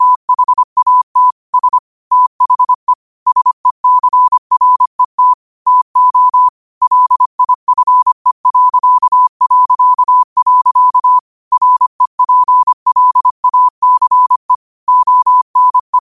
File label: 5 wpm, morse